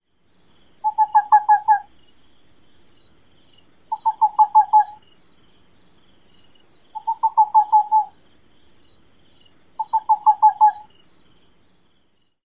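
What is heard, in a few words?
Whiskered Screech-Owl tooting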